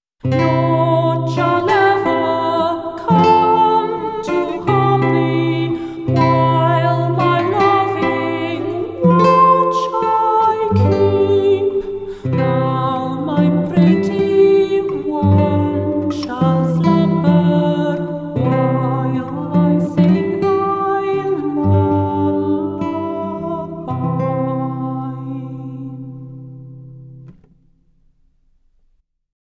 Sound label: rhythm